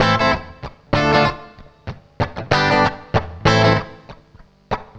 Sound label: CLEAN, CLEAN GTR